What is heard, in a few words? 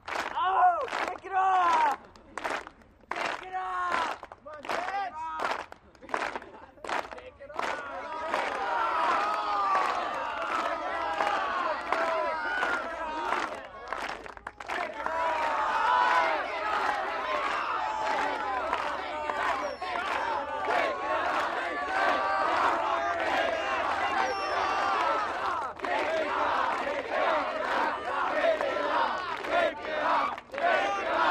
Catcalls From Rowdy